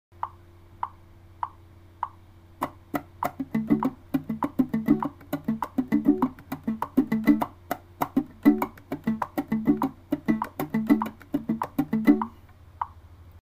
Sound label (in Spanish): Ritmo Salsa 100